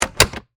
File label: Helicopter Door, Aircraft, Helicopter, Front, Door, Close, Hh-65, Dolphin; Transportation; Helicopter Sound Effects